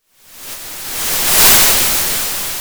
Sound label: Sound of waves crashing on a shore